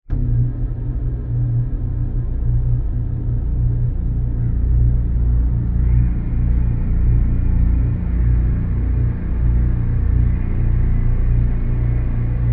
horror atmosphere dark fear with drum hits long slow decay